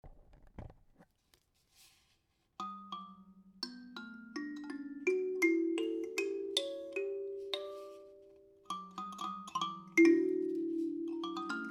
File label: Mbira 15 note